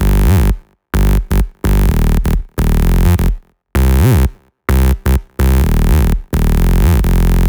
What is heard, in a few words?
128BPM Bass; Vengeance Samples; Celebration Kit